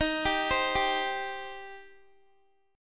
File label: Eb 6th Eb, Guitar; arpeggiated; x,18,19,17,19,x